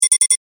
Alarm Beep, Scifi, Alarm, Beep; Tech; Blast Wave

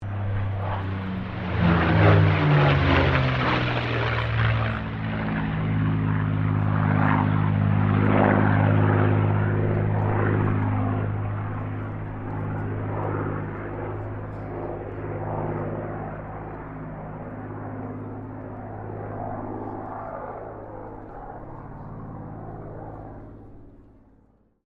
AIRCRAFT CAC CA-18 MUSTANG MK23 PASS STEREO; CA-18 /P-51 Mustang; Airplanes

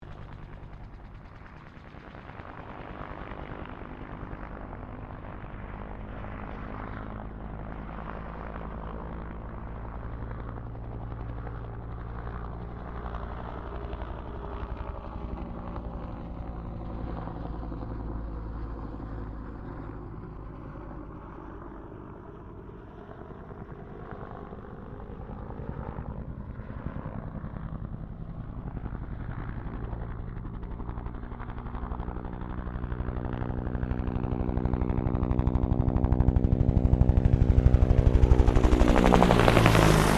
HELICOPTER BELL UH1 IROQUIOS HOVER PASS

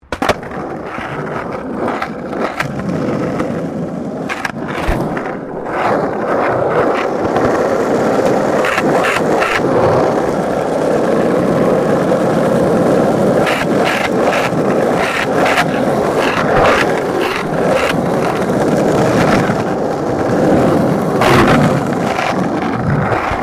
SKATEBOARD UPSIDE ASPHALT MEDIUM; Skateboard sounds; Skateboarding (Sound Effects:Sports); SoundEffects-Foundation4

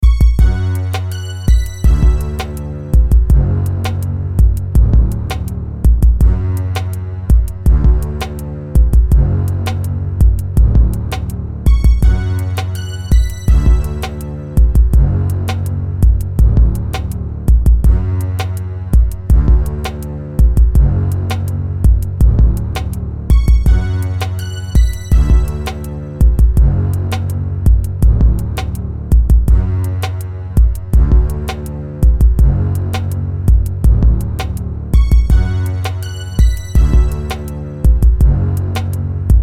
Beat Loop Rap Hip Hop R&B String Synth Cello; Miscellaneous Loops; Blastwave

